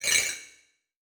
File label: Flash Animation Short; Multimedia Internet CD-Rom Flash